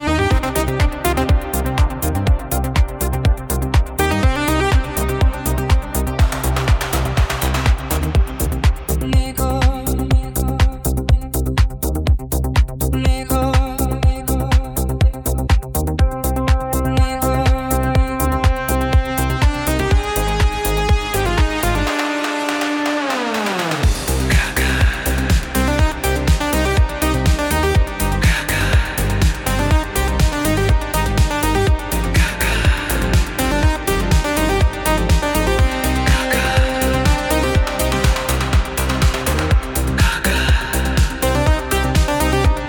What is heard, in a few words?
electronica